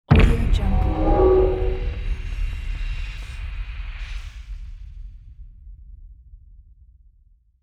epic movie trailer impact cinematic drum organic hit hybrid